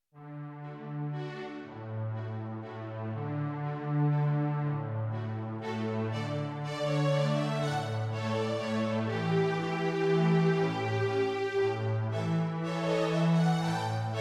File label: Strings